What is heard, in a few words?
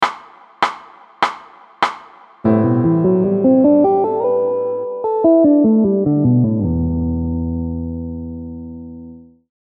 interval